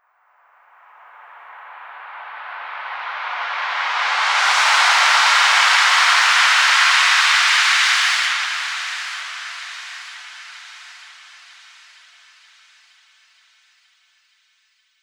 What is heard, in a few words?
FX Uplifter